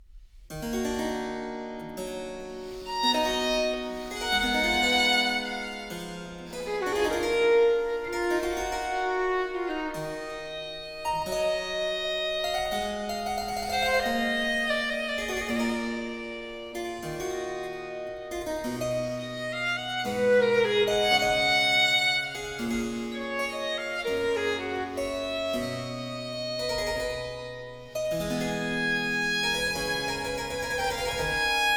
Sonata No. 6 in G Major, Largo, Violin and Harpsichord; BWV 1019; Bach; J.S. Bach